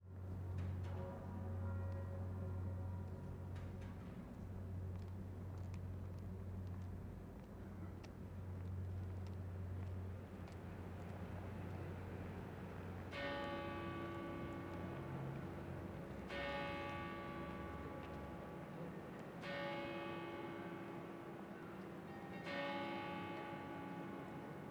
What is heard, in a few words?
Salzburg Bells